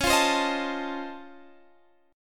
C sharp Augmented Major 9th, C sharp, down, Guitar, Open D